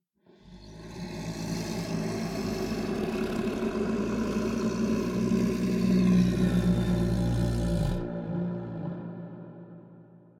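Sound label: ambience bad creature moan; smite; conquest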